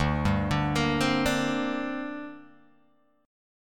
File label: D 7th Suspended 4th Sharp 5th, Guitar, Open G